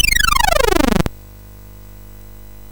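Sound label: Nutcraka, MOS 6581 SID chip music, games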